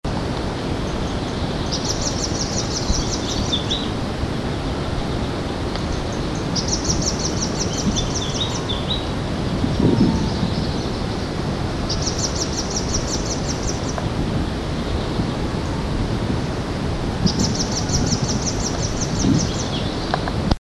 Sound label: cryptic warbler; heard only; Madagascar - 20th Oktober to 16th November 2003